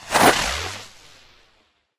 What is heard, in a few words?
Propeller hat Aviator Collect; doodle jump 2; android